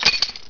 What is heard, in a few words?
glass, debris